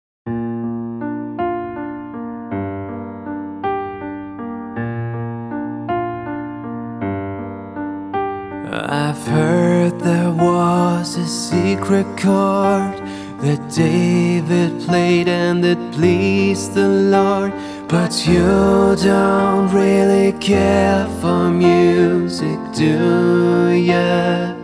pop piano

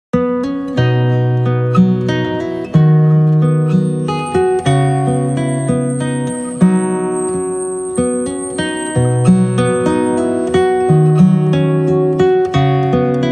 Silent Light, guitar; Guitar Calendar A Guitar Suite

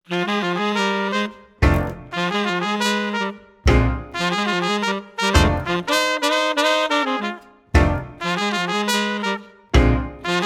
Cookin Blues

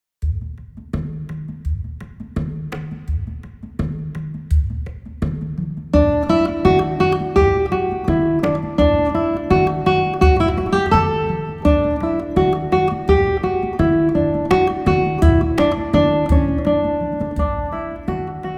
Bransle de Champaigne; Play Guitar Powersteps 1

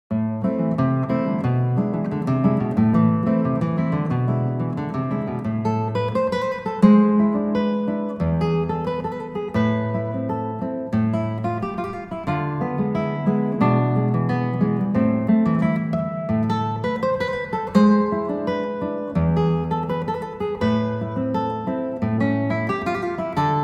Cacique, guitar

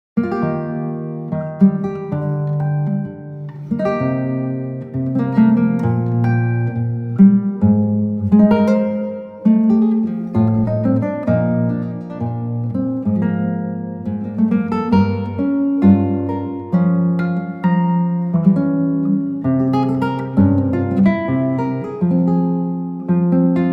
Air BWV 1068, guitar

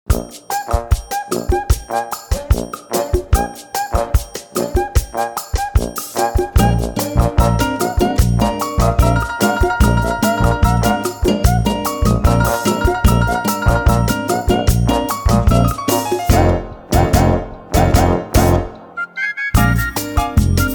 Playalong, Sax; Sax Plus Vol 3

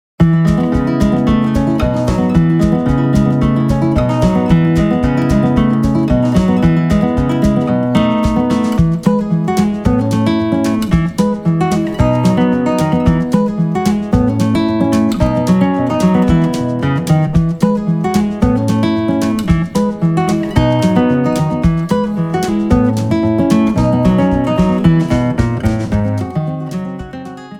Guitar; Saitenwege nach Nordamerika Band 1

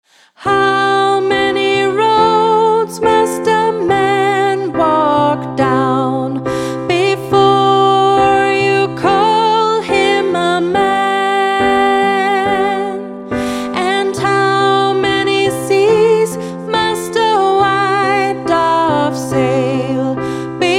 pop piano

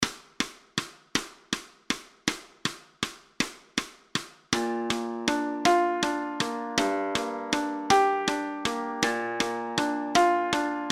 Pop Piano; Pop Piano Patterns

